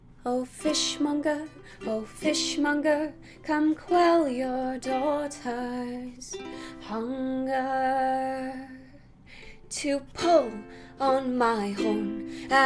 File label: Bard Singing Ukulele Cover; [The Witcher]; [DND]